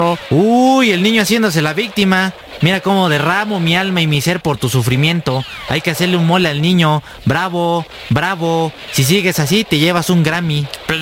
Huyyyy

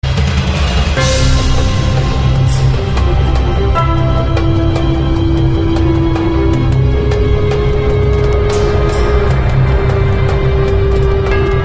Desert Ring Long

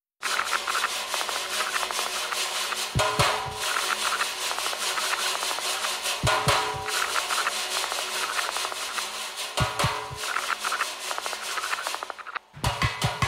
Scissors Intro

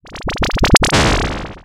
Lazer Zap

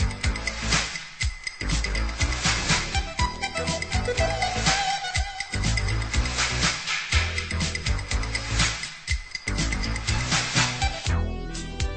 Funk

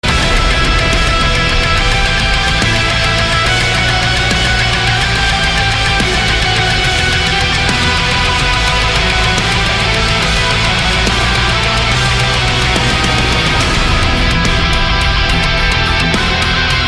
Main Menu Metal Theme

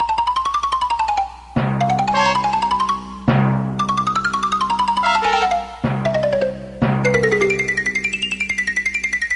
Scratchy Song

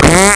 Fart

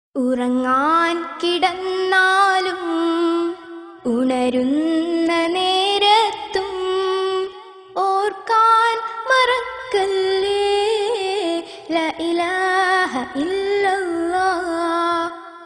Islamic Song

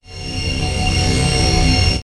Teleport

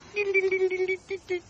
Bubbles

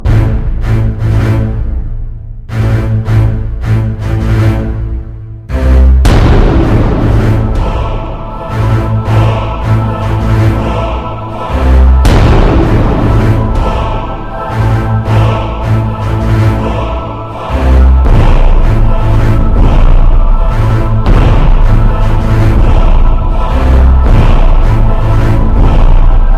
Epic

